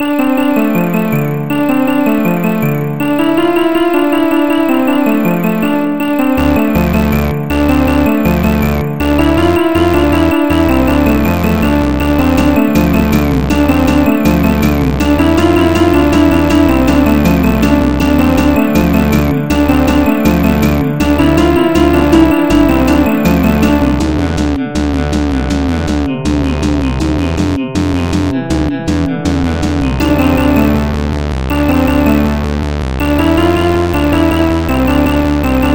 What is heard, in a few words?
Popcorn